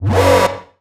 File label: future bass house loop